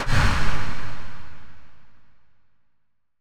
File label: Tails, Drum Bits, Verb Tails